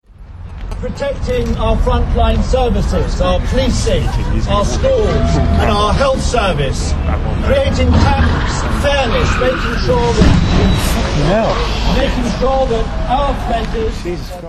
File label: car crash; at labour event attended by 10 cabinet ministers